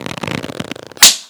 Leather Snap; Welcome to the Game

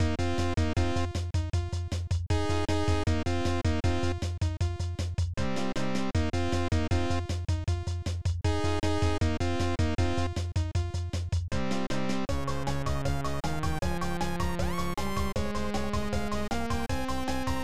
Level Music; Clock Works; SNES

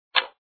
SFX Ammo empty; Bin Laden Liquors

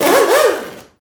Upgrade Sound; boom karts multiplayer kart racing android

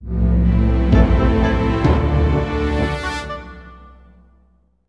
Closing Stinger; medievil game rip

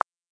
Sound Click; Learn to Fly 3

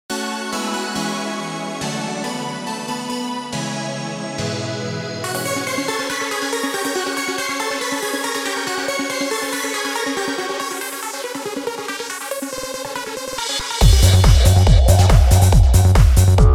Psy Trance Remix

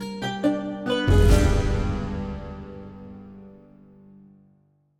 String; Assassin's Creed Unity Dead Kings

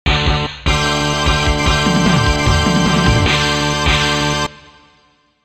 Unknown Fanfare; hot wheels burnin rubber gba

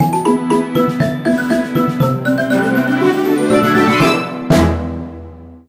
quest completion; Yo-Kai Watch 1 for Nintendo Switch

